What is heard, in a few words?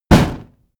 fire hit; whooshes rises; hits impacts